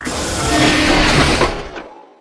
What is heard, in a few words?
ship landing gear down